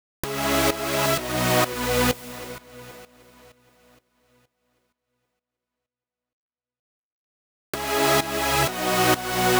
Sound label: synth chords, Key A Bpm 128; Loop Nerds; Big Room House Allstarz